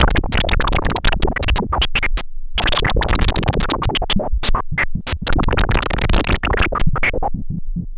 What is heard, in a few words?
Pulse, Filtered Noise